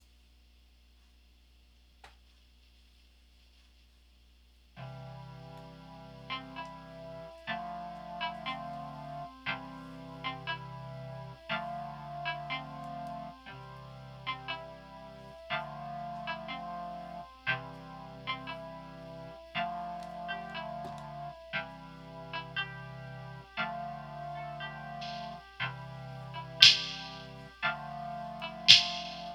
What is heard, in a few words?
synthwave start; beats